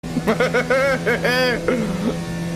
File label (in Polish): smiech demonz